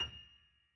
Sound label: key